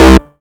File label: Acid Sounds C, Synths; Vengeance Samples